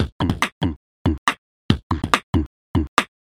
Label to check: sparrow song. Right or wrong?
Wrong